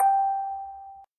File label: orgel